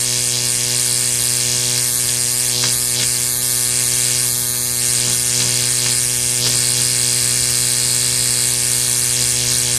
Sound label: Electro; guns n zombies 2014 pc